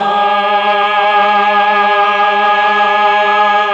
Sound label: Melchog3, MelloChoir; Oldies